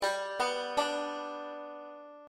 g major triad, banjo; beginning 5 string

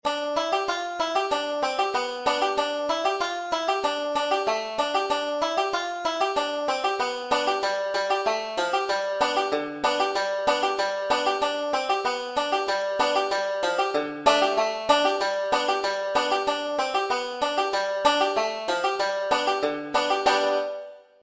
g solo, banjo; 5 string; old joe clark

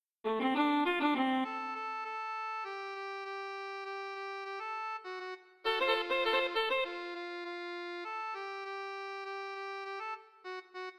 instrumental solo d; banjo

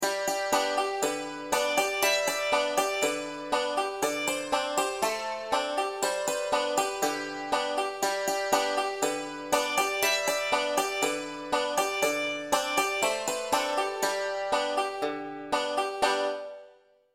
buffalo gals simple acc w melody, banjo; beginning 5 string

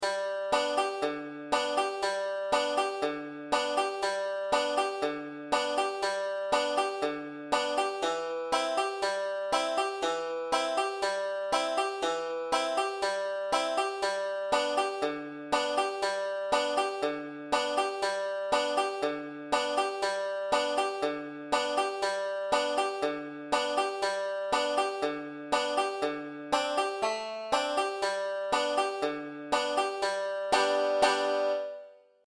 simple acc banjo only; 5 string; this lil light

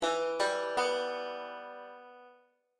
em triad, banjo; beginning 5 string

